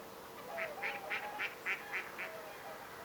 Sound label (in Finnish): tuolla tavoin aanteleva sinisorsalintu